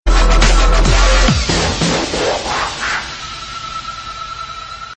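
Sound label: dub transformer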